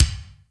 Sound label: kick drum c key, kick drum sample tuned to the C note. Loudest frequency: 2623Hz